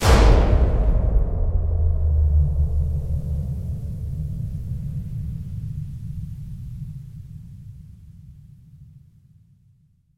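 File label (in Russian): большой драматический взрыв или что-то в этом роде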